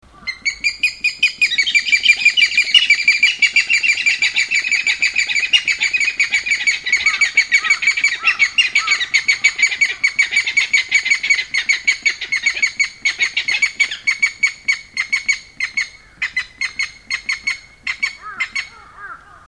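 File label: yanbaruku ina sound, Okinawa rail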